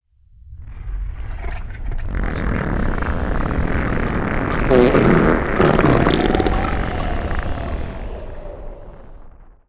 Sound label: Fart